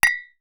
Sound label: Household Glass Clink Two Coffee Mugs